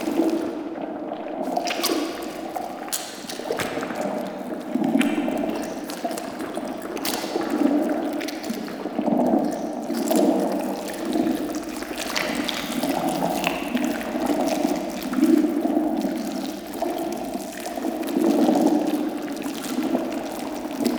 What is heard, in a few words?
Infested Eris Interior Brain Tunnel Loop